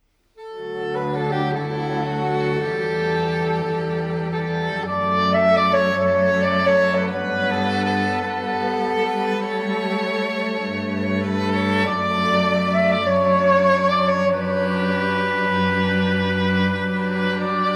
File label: gabriels, string quartet; oboe